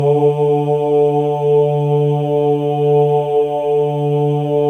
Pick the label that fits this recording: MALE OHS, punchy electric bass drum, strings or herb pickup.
MALE OHS